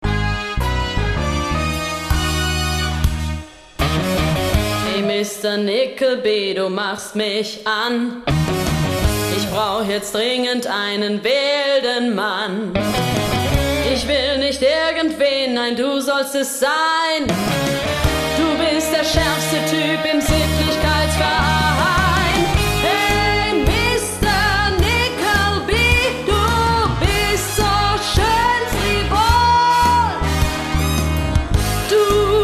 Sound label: jingle